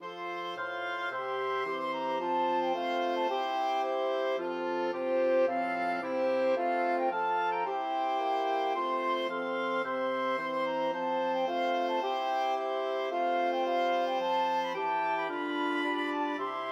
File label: Trumpet Tune 5tet